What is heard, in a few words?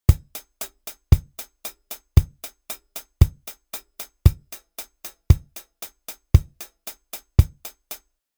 Kick drum hi hats